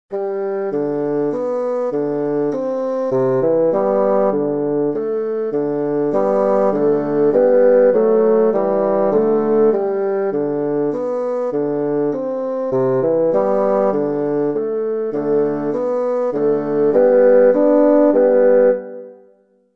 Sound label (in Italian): Fagottino